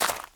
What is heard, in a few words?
gravel walk